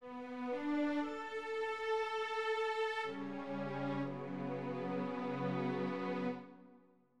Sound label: lose music